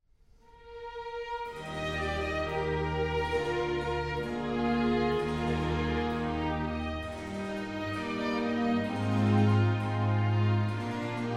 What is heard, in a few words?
Concerto in E Flat Major for Horn, Strings and Continuo - Largo; Baroque.horn